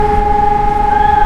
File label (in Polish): syrena